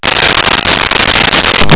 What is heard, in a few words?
qpsk; new